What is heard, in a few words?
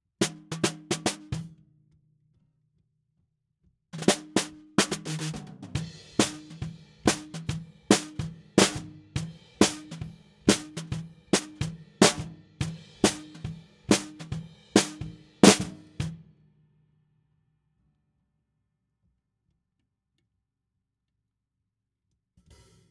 SNR BTM